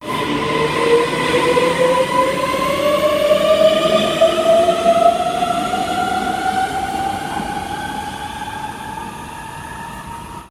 oresundstog, toglyde

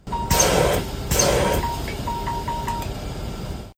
ic3 tryklyd, Lyden fremkommer når man opsætter ETCS system på IC3-togsæt.

